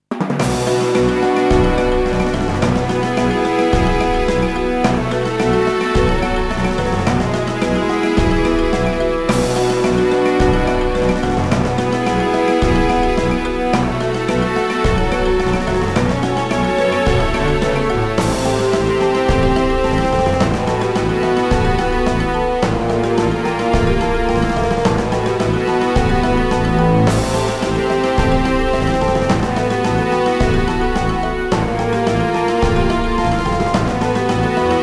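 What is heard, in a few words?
Lava Cave (Without SFX); Dokapon Kingdom; wii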